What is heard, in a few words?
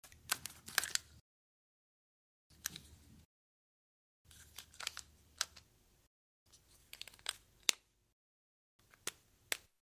Putting a cap on a ballpoint pen